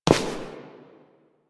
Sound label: Step; Warframe; Koumei